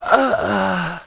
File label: DYING; BattleSNDS